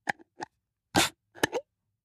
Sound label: Jam Jar Unscrew Lid, kitchens